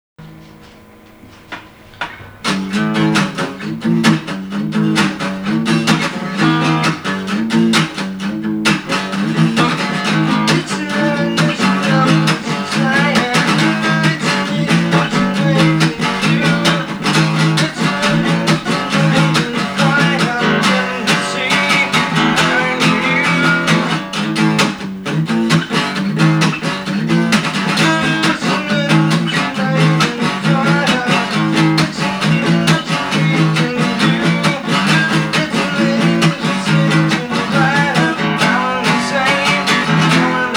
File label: (Solo Acoustic)